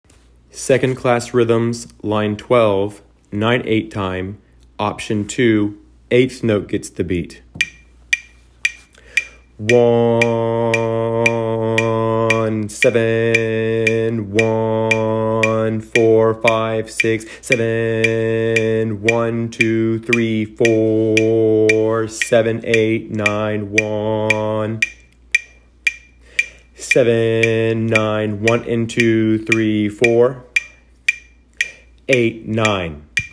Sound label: rhythms eighth note